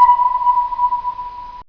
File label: uboot sonar radar